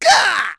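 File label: Vox Down, English; Ezekiel; kingsraid; voices heroes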